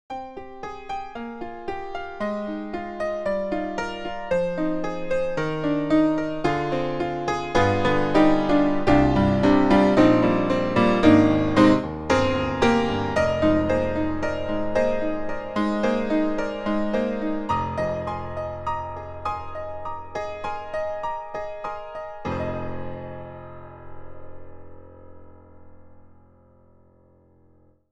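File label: blue grand, piano; IK Multimedia; Sonik Synth 2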